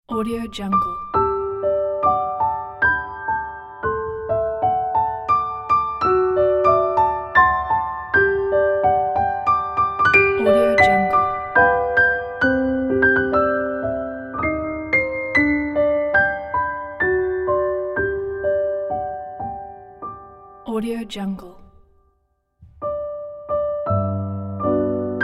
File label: happy birthday, piano